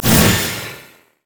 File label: voidling grow; LoL; alzahar